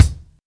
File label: puffy top end kick sound C key, bass drum single hit tuned to the C note